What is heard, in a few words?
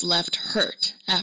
Hurt; Phasmophobia